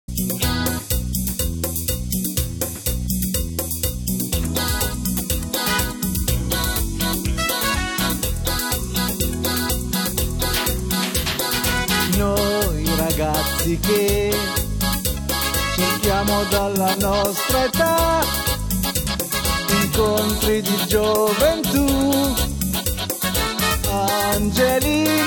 cumbia

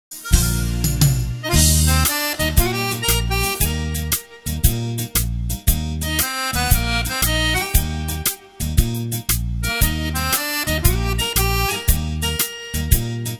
carillon

